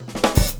FILL, Grooves Filz Hitz

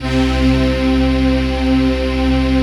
PAD, Dance Mega Synth

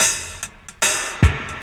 HAT, HI HAT LOOPS, Dub; Drumdrops In Dub VOL-1

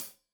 Hat Tight, Hi-Hat; Deeply Sampled Drums

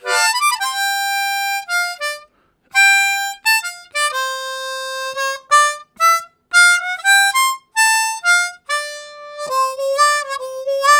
Gliss Up, Harmonica; Zero G

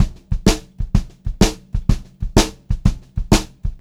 126 CL VARI L, Pop-Rock Drumloops

